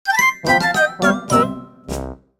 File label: Fail; Wii Play Motion